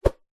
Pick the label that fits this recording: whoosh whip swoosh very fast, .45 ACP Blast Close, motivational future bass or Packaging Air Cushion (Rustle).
whoosh whip swoosh very fast